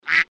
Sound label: quack single, Ducks; Fluffy Arcade: Doubleup Ducks LuckyTap; Eyecon